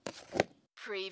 Remove plastic lid from coffee jar

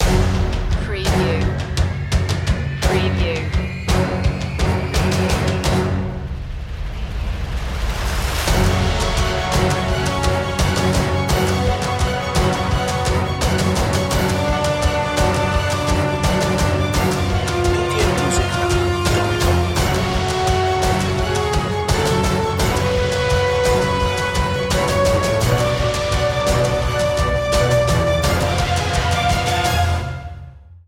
dragon heart no choir

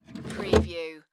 Drawer Open Desk; Sound Effects Bible

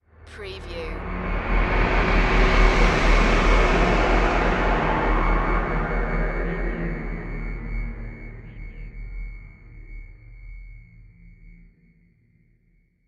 Swell Dark

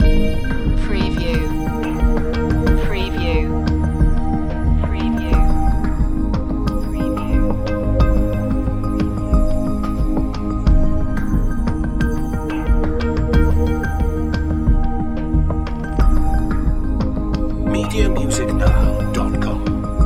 hidden beauty loop

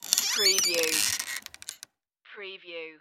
wooden hut door open

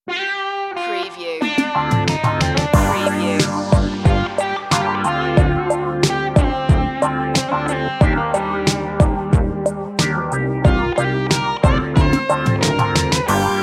mellow retro

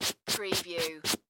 Cloth Wipe Fabric Suede

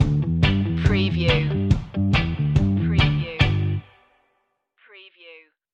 rhythm kings stinger

